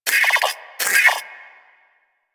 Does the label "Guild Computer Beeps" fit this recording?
Yes